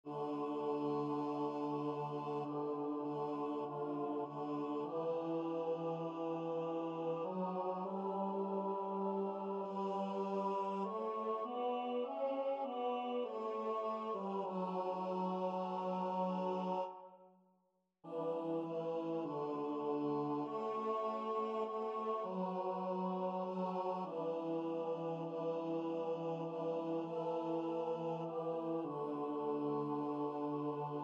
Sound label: bass